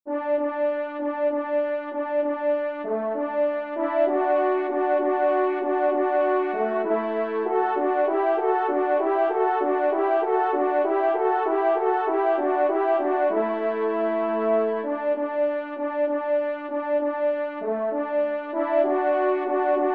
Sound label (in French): Fanfare Do Maj, trompe; 3e Fanfare; Corrette